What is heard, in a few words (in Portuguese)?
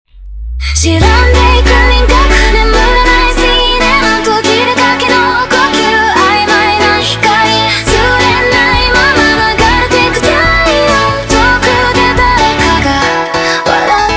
Montagem